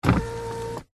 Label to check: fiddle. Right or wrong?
Wrong